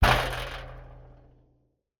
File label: Impact, bleachers, knocking, resonant, outdoors, slam, clatter; Eiravaein Works; Vaeyan IV